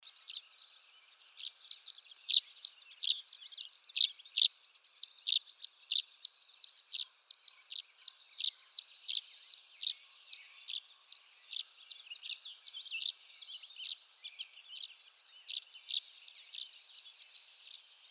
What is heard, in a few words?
Violet-green Swallow, Tachycineta thalassina; swallows swifts